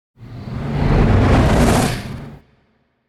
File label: Whoosh Heavy Air Flying Away; Hollywood Edge; sound designer's toolkit v2